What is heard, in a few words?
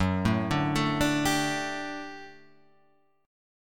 D Suspended 4th F sharp, guitar